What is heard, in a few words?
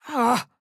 AHurt